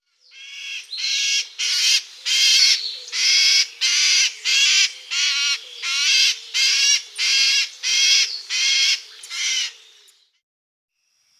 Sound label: Trembleur brun Brown Trembler; Guadeloupe; chants des oiseaux